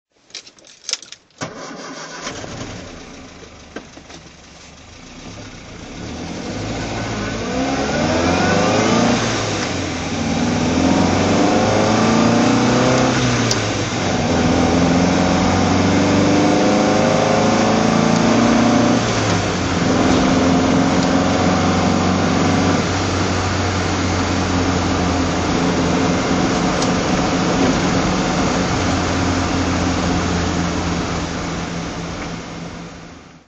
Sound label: mg mga 1600 coupe drive